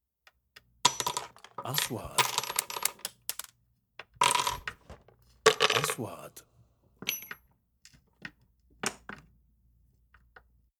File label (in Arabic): مسامير; sound effect